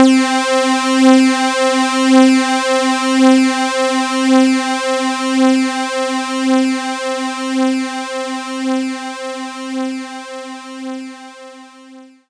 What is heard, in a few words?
Stacked Saw